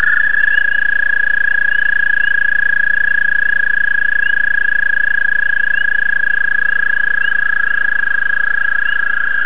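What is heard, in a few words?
American toad; frogs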